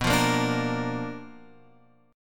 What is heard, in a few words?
B Minor Major 7th Flat 5th Guitar Open F; strummed; JGuitar